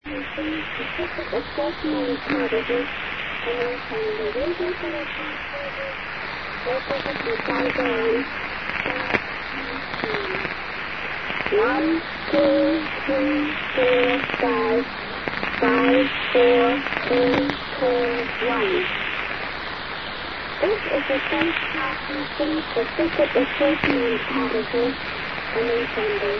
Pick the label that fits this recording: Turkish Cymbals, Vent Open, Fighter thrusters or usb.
usb